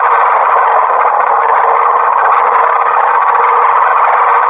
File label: roller mine move fast loop; hl2